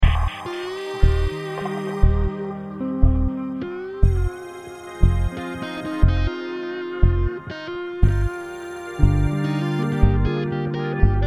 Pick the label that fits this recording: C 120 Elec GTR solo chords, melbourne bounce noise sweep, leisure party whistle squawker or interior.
C 120 Elec GTR solo chords